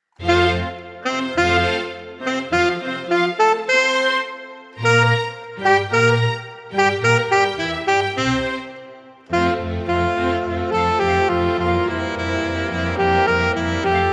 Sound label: Alto Sax Performance; Play Easy Classics for Alto Sax Book 2